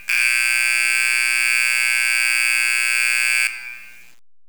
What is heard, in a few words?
horn, Federal Signal; 350 450